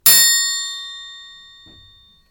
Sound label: Triangle